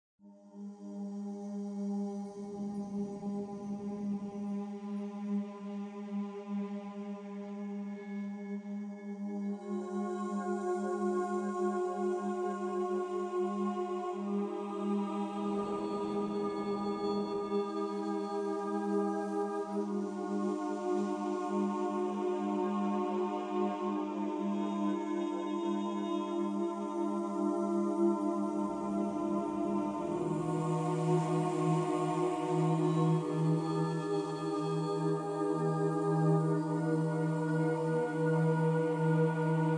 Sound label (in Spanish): Suaves voces; SanaterraMusic; musica psico ambiental